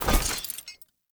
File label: Heavy Grunt Foley Body Fall; Sinecure; Enemy